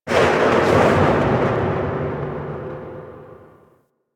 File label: Corpus Ship Exterior Thunder Claps; Ambience; Warframe; Corpus Ship Remaster